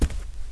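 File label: dark templar foot; Kara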